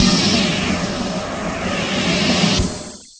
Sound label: ending, sfx; BALLY